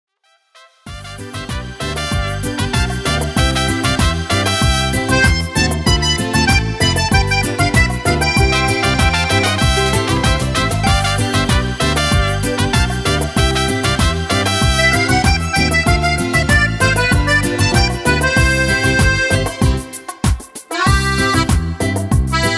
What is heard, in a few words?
cumbia